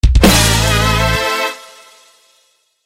tieng trong joke

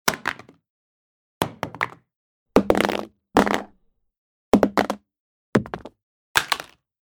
Tieng dien thoai roi

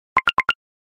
am thanh thong bao line tieng go

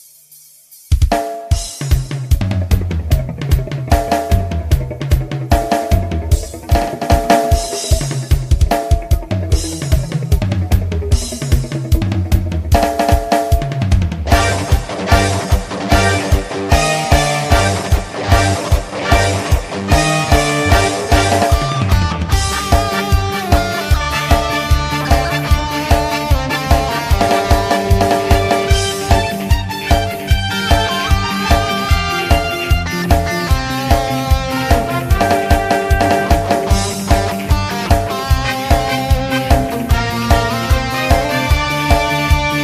Nhạc chào mở màn chương trình full band

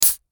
Tiếng bật nắp lon nước ngọt cocacola

